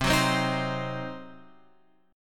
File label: C Diminished C; Cdim Chord {8 9 10 8 X 8} strummed